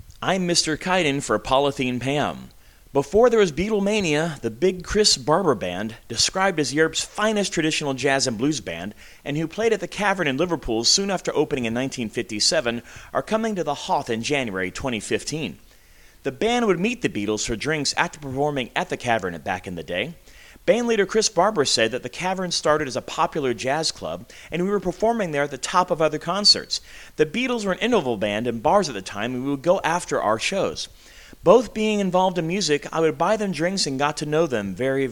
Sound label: Jazz